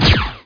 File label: repeat; MOTS